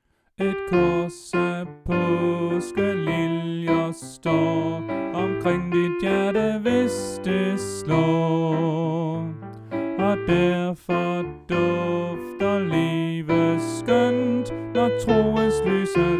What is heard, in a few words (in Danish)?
Sopran